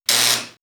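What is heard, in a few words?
Laser Beam, electronic fx; X-Static Goldmine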